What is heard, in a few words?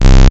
Basses Root C